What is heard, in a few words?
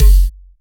Kicks; Vengeance Samples